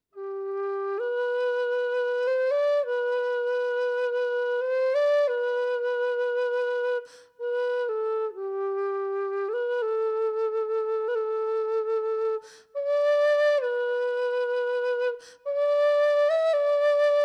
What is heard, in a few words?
whistle G rosewood